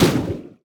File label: enemy elemental melee